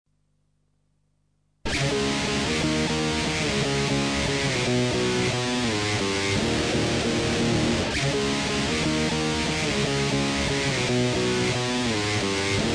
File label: reverse heavy gtr